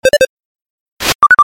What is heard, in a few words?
bash fail